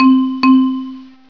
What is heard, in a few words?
Lift Tone; bayarea; SFMuni